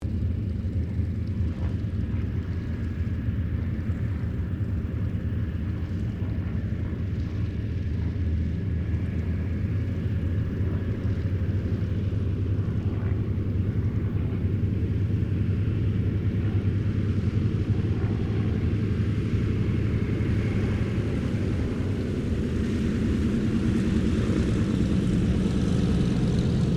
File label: AIRCRAFT DOUGLAS DC3 TAXI; Qantas 1942 DC3; Airplanes (Sound Effects:Vehicles)